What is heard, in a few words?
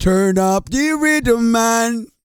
REGGAE, Vocal Planet World Voices